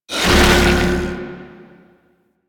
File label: Fusion Pillar Cast; Warframe; Warframes; Qorvex